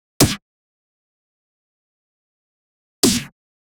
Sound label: compact drill snare one s, tool